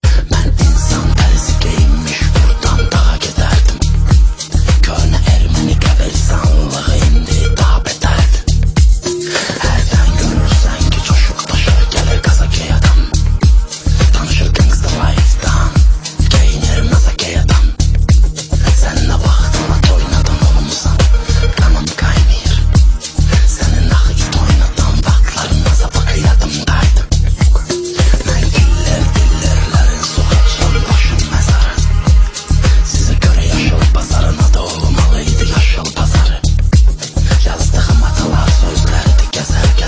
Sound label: rap